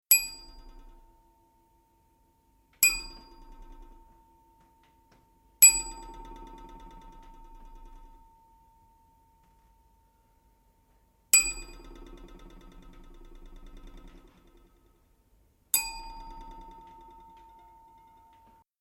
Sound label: Bells Before Breakfast